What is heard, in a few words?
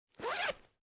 Zipper Zipping Bag Open, Foley, Zipper, Zipping Bag Open; Objects